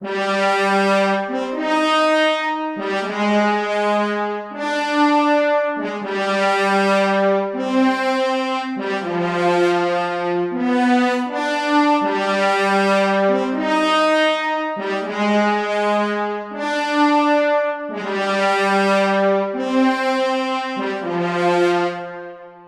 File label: French Horn